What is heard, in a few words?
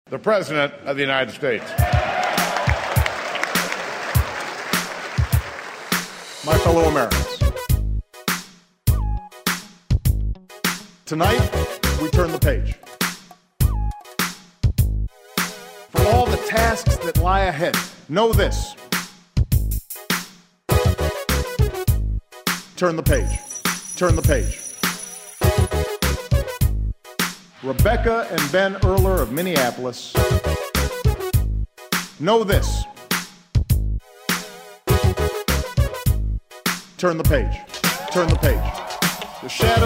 Karaoke Music Bed; CLARadio